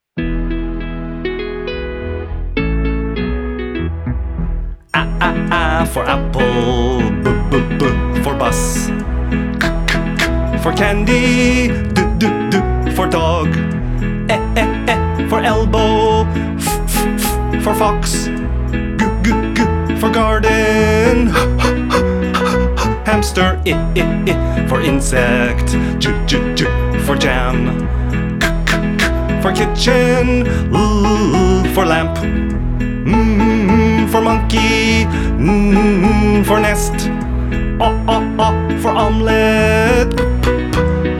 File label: Phonics Song